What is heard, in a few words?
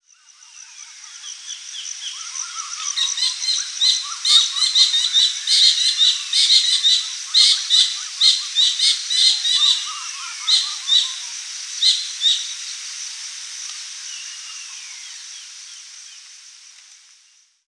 Kingfisher, Bird Calls